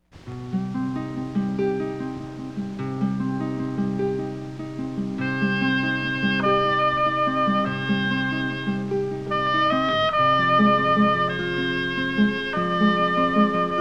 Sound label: Instrumental